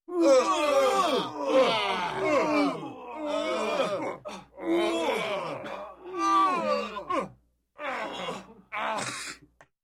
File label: Multiple Men in severe pain